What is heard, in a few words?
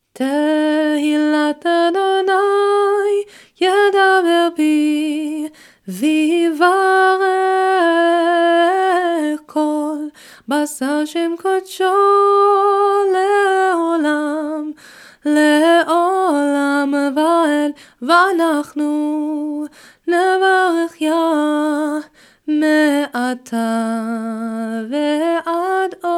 Ashrei closing camp tune; weekday minchah